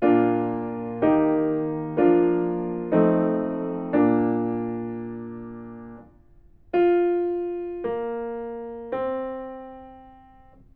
melodic fragments; ear training

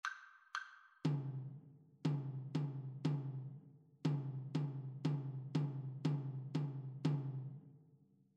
rhythmic dictation

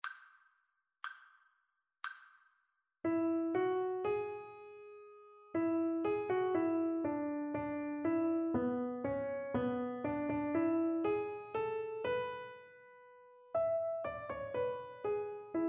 melodic dictation; eartraining

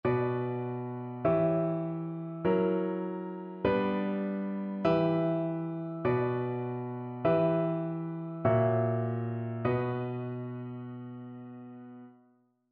harmonic error detection

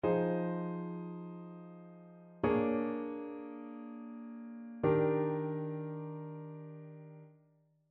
ii V I progressions; ear training

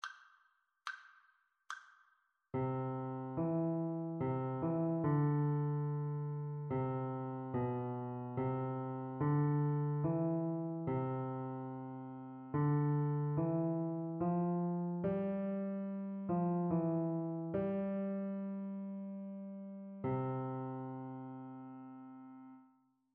partially completed melodic dictation

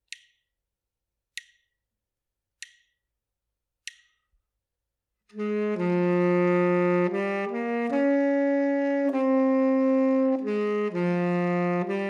melodic dictation

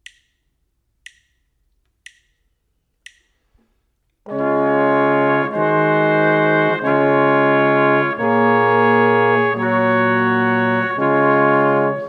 harmonic error detection

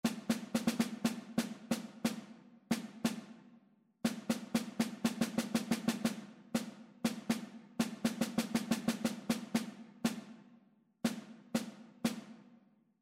rhythmic error detection; ear training

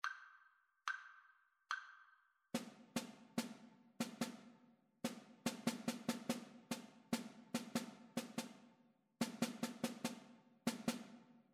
rhythmic dictation

